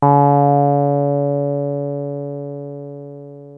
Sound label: 303 C3, TB 303, Synthesizer; Hollywood Edge; Giorgio Moroder Rare Synthesizer Collection